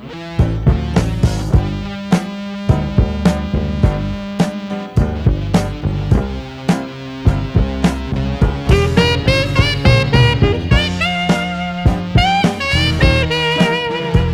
Instrumental

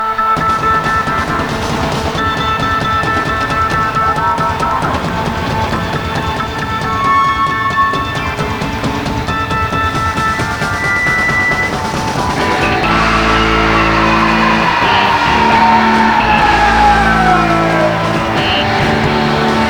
Drum Solo; San Diego 1976; October 7, 1976

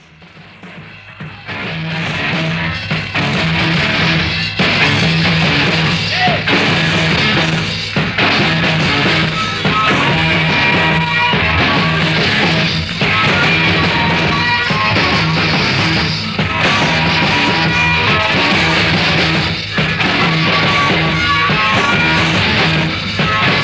Improvisation

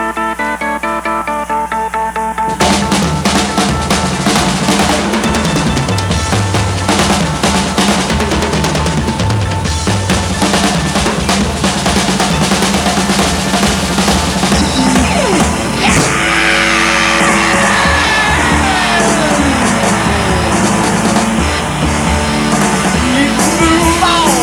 Drum Solo

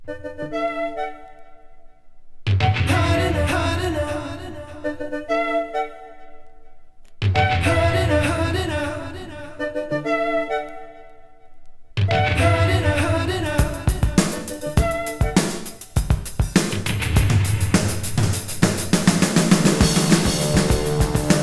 Instrumental; 1985 UK 12" (Instrumental)

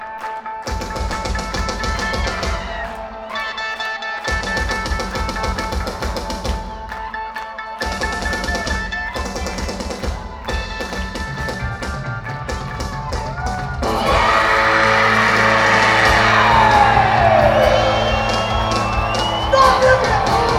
Drum Solo; Dolby Live Las Vegas; 4 November 2022

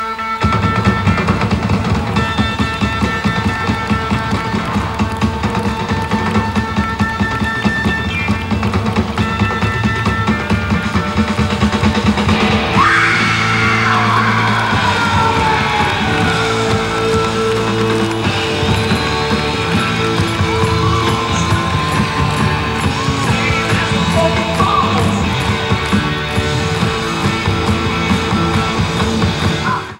Drum Solo, Live; Kansas City 1975

